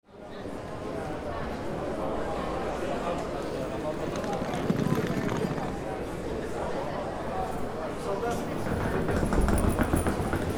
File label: Airport arrivals ambience voices suitcases and luggages